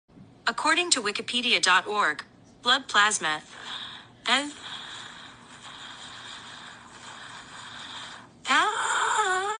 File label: moans; siri